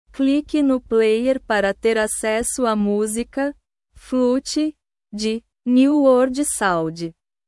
flute

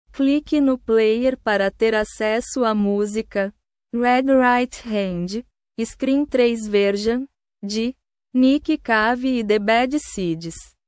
scream

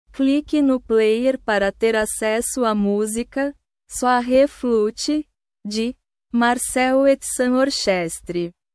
flute

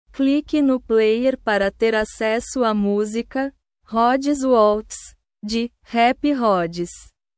waltz

